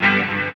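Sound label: guitar, right; East West - Phat & Phunky CD2; 90's Sample CDs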